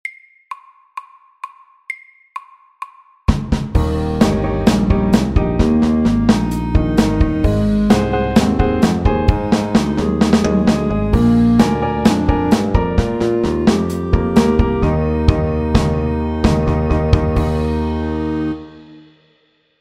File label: Clarinet blues FAST 130